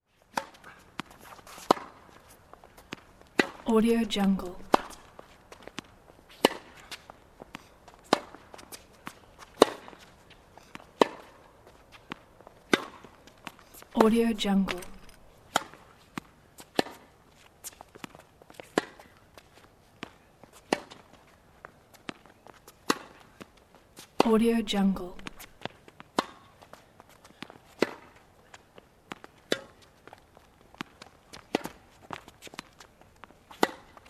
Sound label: tennis on the street